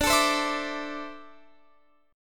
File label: D 7th Suspended 2nd down Guitar Open F; D7sus2; strummed